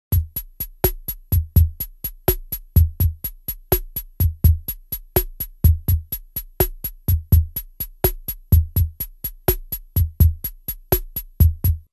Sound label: RHYTHM SLOW ROCK